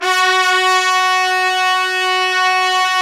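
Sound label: BRS TPTS, Trumpets Section f; Roland LCDP06 Brass Sections; Tpts Sec.4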